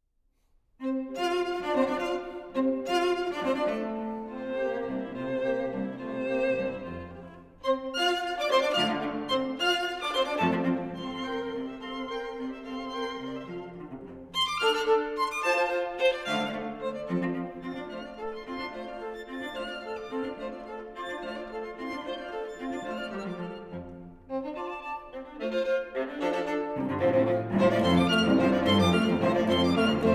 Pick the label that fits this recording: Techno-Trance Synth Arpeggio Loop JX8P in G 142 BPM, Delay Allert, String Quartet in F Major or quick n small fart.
String Quartet in F Major